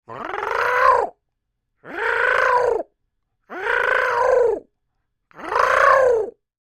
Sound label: Creature Oodle Bird Calls Upset; Birds; Animals